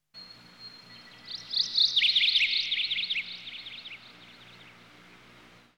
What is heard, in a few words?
AMBNT 160; eJay; Ambient